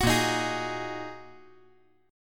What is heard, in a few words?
Bb 7th Suspended 4th Sharp 5th, chord; 11,10,11,11